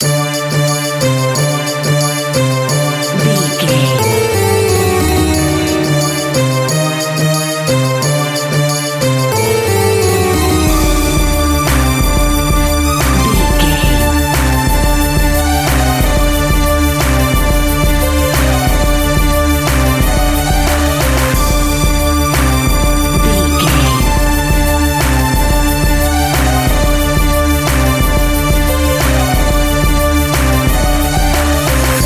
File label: south asia music ambience full, An exotic and colorful piece of World music. Groovy, powerful, exotic and wild